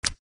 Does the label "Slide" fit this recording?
Yes